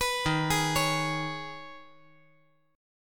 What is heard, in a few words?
Eb 7th Suspended 4th Sharp 5th